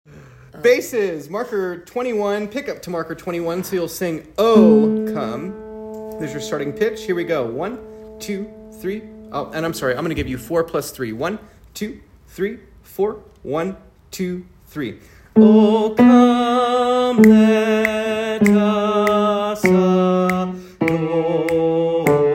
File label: Bass, choir